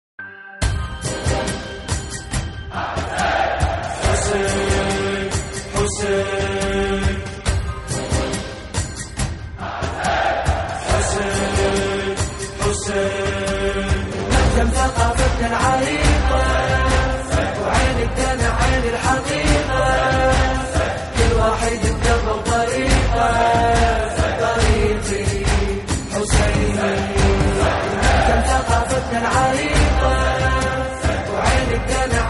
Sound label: nawha arabic